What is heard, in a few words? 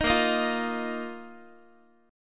Bb Suspended 4th Bb down Guitar; strummed; tuning 4A,4E,5A,5D,5G#,6C#